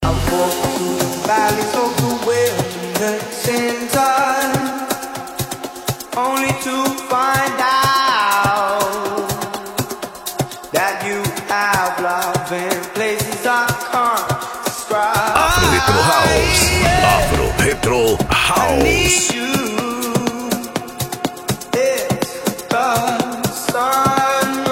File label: Afro Retro House